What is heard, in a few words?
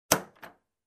Door lock click sound